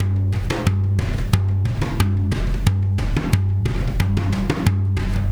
Tactile Impulse, Processed Drumkits

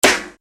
PYANG SD, Yamaha RY-30

